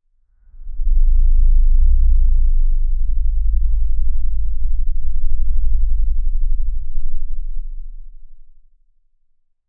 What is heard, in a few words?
close up asmr sound of a, leather